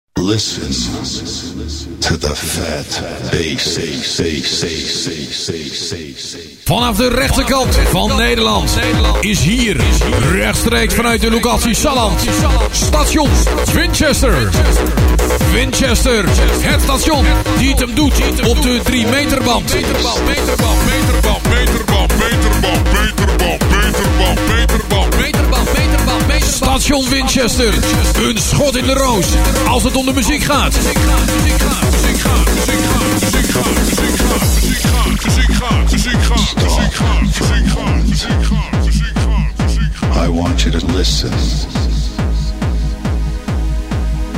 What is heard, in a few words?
Jingle; Winchester; Arkan Radio